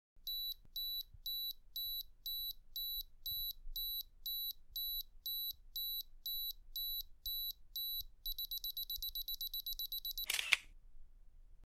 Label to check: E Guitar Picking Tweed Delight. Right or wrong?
Wrong